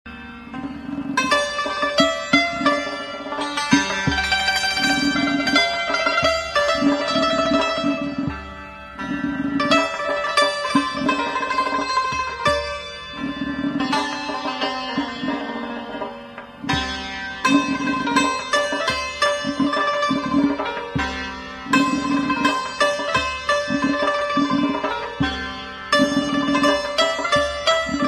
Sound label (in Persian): Tasnife Santoor